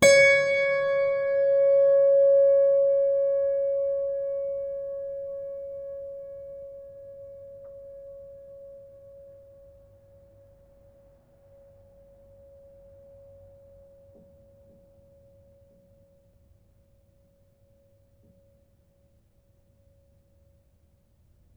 String Pluck Ped, piano; Piano Miscellaneous

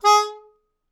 Melodica Short; Short Notes Chromatic

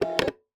Single Shunt, DVD Drive External

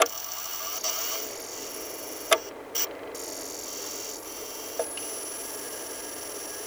Spin Up, DVD Drive Internal

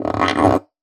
Classical Guitar Extended Technique

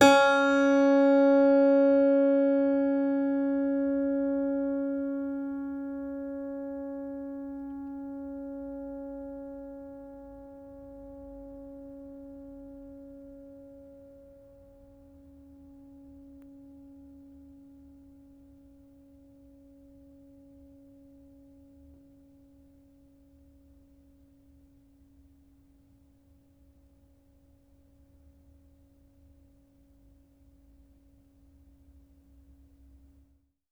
String Pluck, Piano; Piano Miscellaneous

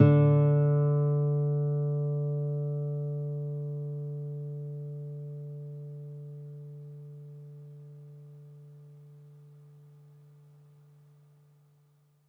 Open, Classical Guitar

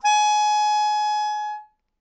Melodica Long, Long Notes Chromatic

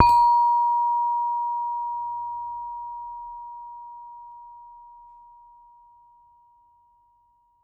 Saron B, Gamelan

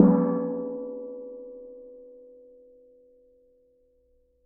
Strike, Washing Machine Drum Outside

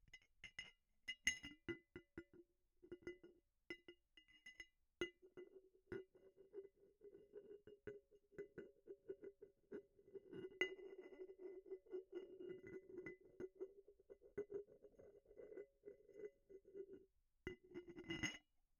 Bottle Struck

